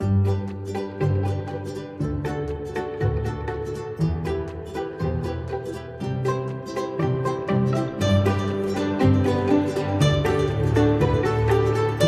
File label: Stylish Ambient